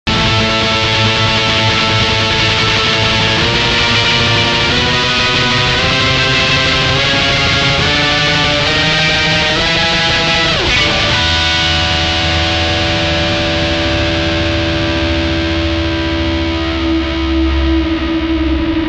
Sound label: MAXON AD999 Feedback